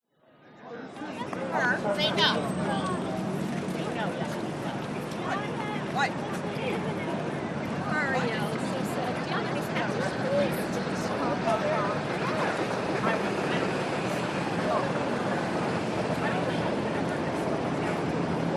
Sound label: city ambience nyc times square daytime people chat walk city hum